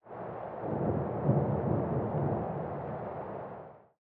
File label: thunder